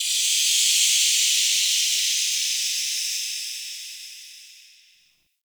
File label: Relay Steam Blast; Warframe; Ambience; Hub; Tenno Junction Relay